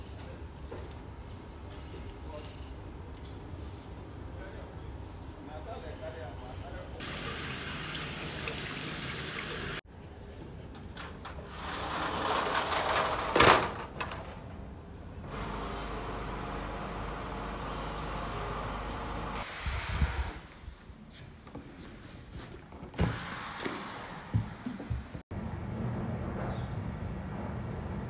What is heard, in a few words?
soundscape, Lagos is quiet on a Sunday; Nola Ayoola